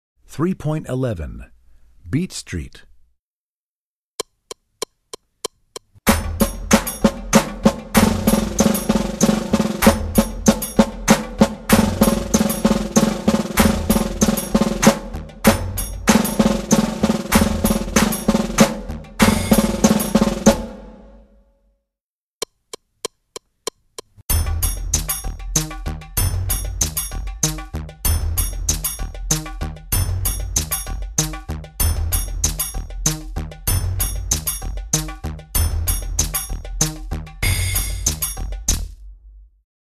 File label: Beat Street, Percussion; Percussion Book 1